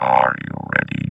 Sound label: VOX VOX, Electro Vox